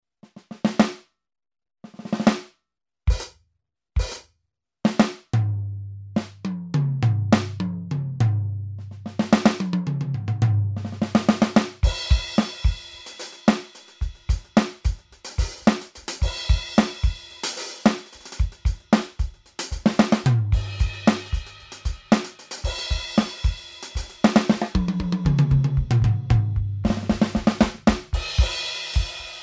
Funky Fun; ATV a5D; Steven Wilson Kit

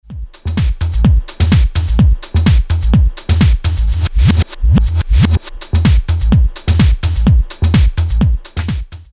Reverse

